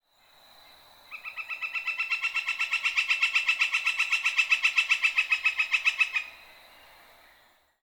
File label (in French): pic flamboyant